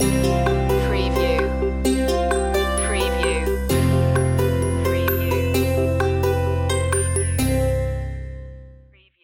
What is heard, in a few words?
the quirky traveller stinger